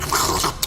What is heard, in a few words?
slurp; Snapcube Sonic 06